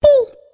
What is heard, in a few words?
click; monstergo; pokebot